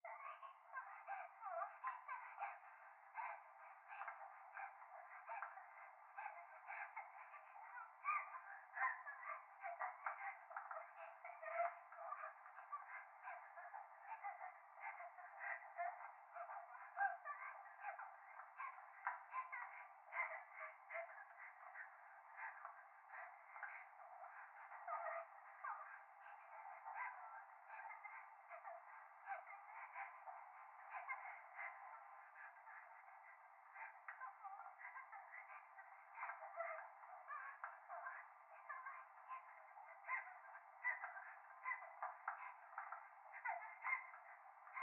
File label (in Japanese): 風呂 可愛い 喘ぎ 電車